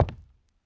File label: pl wood, player; cstrike